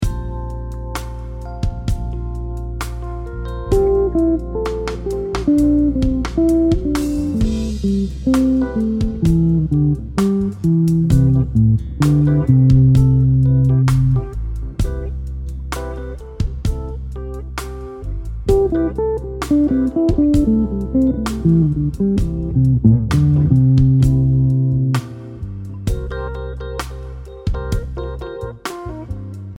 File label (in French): pattern sur Am, guitare jazz